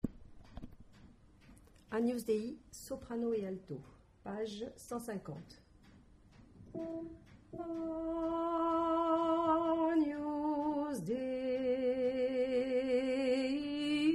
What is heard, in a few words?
Satb